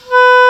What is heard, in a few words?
Oboe p B3; Digital Sound Factory Virtuoso